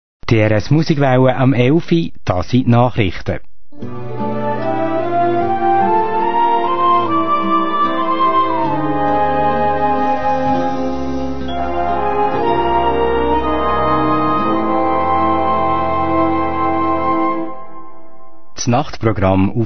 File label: interval signal; drs5 musikwelle